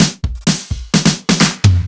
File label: Fills 128BPM, Clubsounds